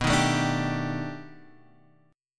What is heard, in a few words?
B 7th Sharp 5th Sharp 9th, guitar; strummed